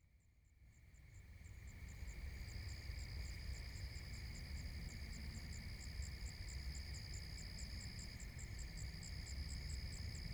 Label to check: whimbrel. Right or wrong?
Wrong